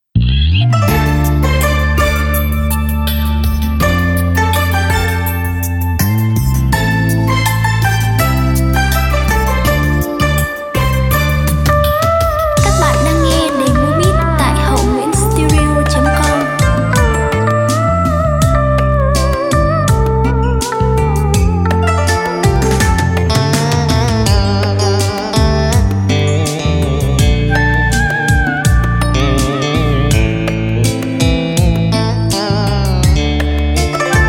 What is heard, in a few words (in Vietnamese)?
beat